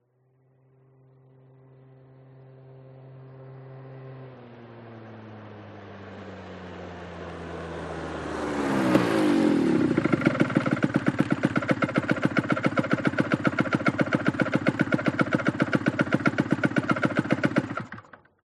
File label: PIAGGIO ZIP 49cc External Approach and Shutdown Idle Fast Close Stereo